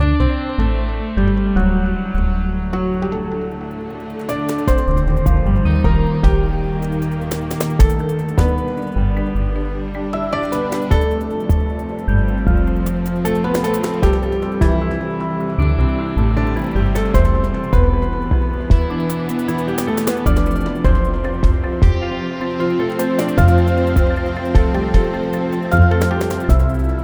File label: Jingle